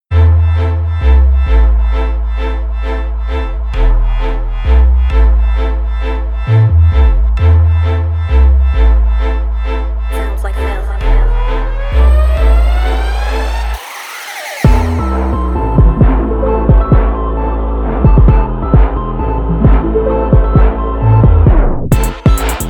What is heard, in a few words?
Instrumental